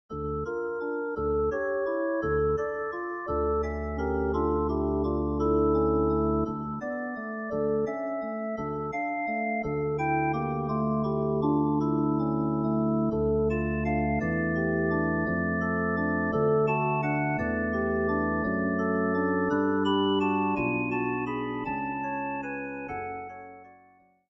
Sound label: organ